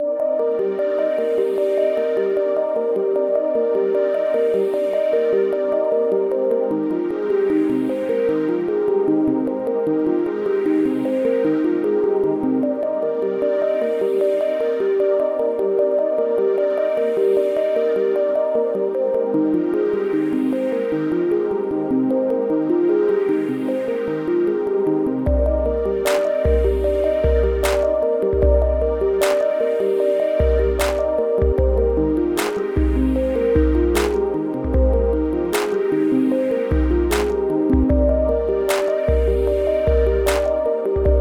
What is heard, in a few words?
Aquatic Ambiance